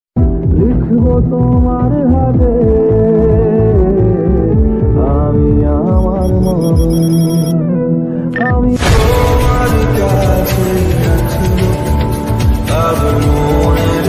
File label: Bengali Ringtone